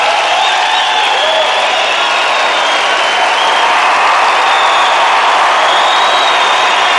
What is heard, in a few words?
Americanfootball Crowd